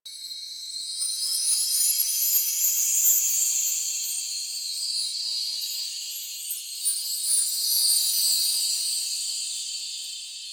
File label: Hydraulus Active Loop; Warframe; Bard Quest